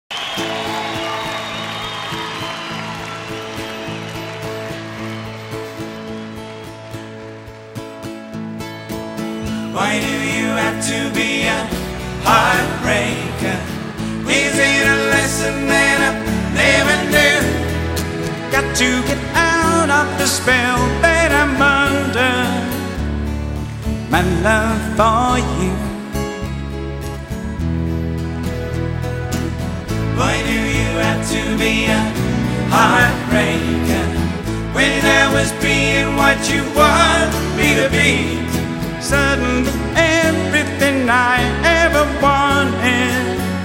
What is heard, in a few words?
Concert